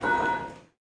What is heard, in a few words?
beep; zones; Airport